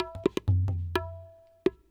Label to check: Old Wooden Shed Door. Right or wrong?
Wrong